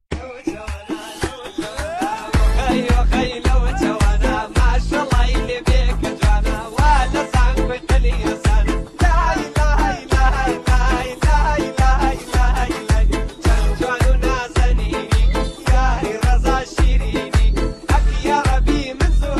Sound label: Remix